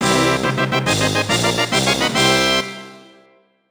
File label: Collect Secret Item; Banjo-Kazooie